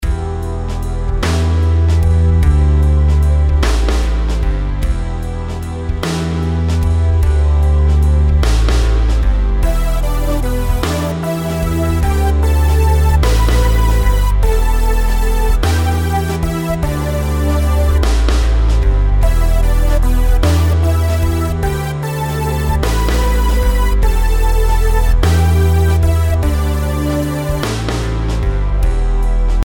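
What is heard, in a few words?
melodies compound 8s E minor; melody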